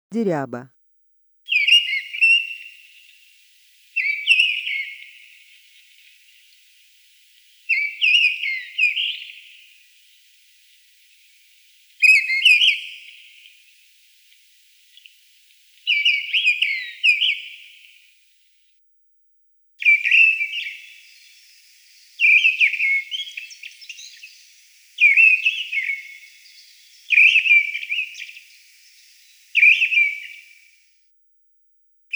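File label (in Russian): deryaba golos, mistle thrush voice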